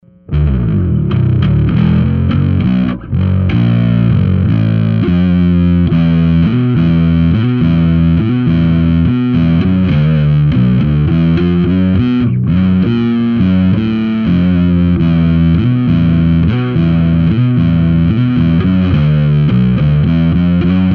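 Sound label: bass overdrive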